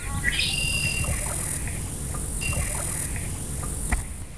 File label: redwing; birds; usgs